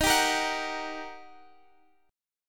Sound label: G Minor Major 7th Sharp 5th Eb